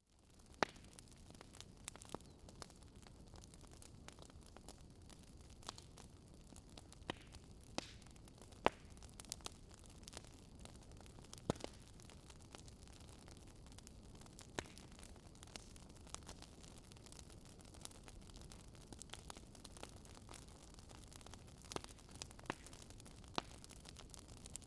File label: fire